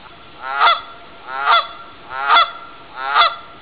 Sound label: canada goose, birds